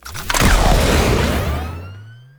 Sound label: Hoverboard Boost Fast; Warframe; Vehicles; Venus